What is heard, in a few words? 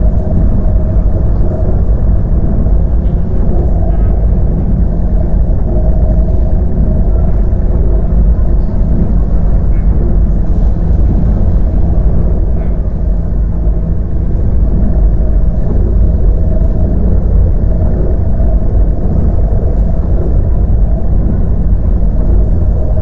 arthropoid colossus idle; apocalypse